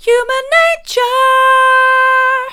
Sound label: mid, vocal